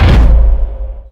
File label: jump